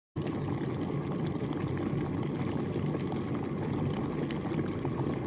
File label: Electric Teapot; چای ساز برقی